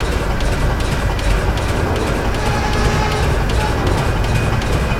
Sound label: Cave Factory Ambience; avant gardens